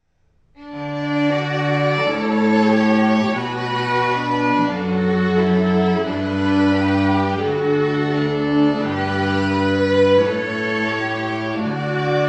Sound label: strings